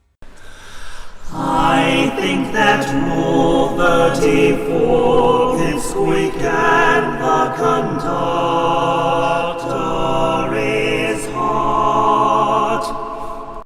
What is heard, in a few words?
Gregorian Chant; Conductor